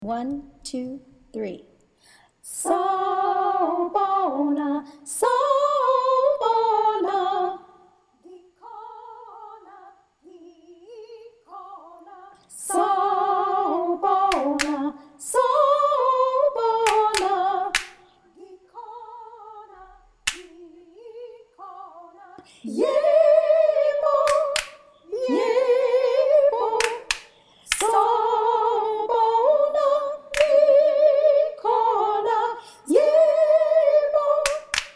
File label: sawubona high